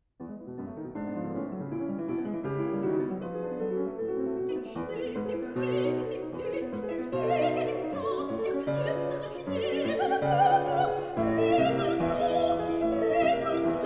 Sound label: song for voice & piano; Rastlose Liebe ("Dem Schnee, dem Regen"); Schubert; Lieder